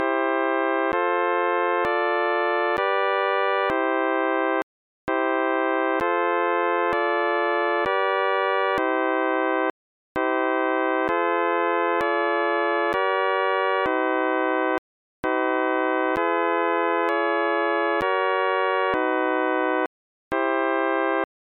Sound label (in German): zweite Stufe Kommafalle